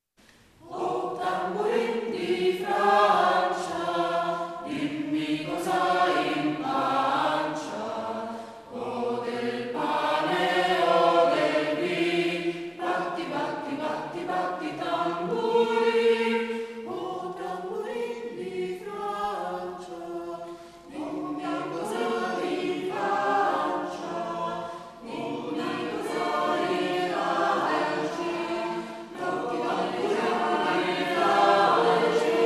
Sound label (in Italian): tamburino coro